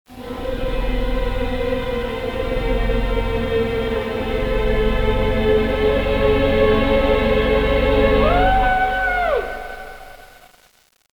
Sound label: Muzik, Halloween; bruitage